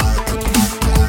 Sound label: drop; Launchpad Mini MK2; Lightshow Pack Project